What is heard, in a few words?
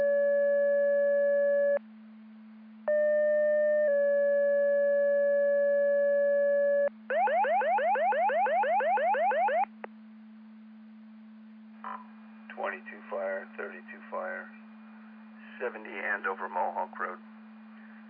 Siren; Andover Twp Boro